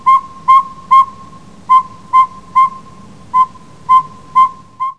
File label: saw whet owl common